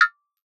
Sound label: error